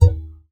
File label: Classical Guitar Extended Technique